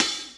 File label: HH, Dance Mega Drums; Best Service; HH-HIP